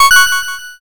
notify

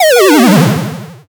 powerdown

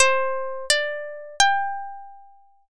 koto

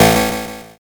zap

